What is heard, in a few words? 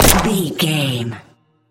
gun pistol shot